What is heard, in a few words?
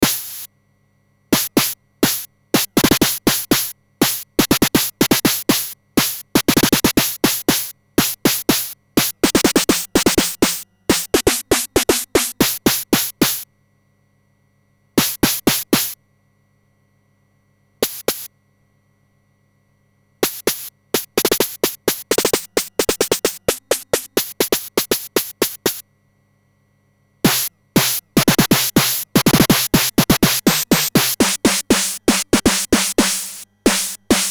SID SNARE